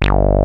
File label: BASS, BASS STATION; Syntec; Wall of Sounds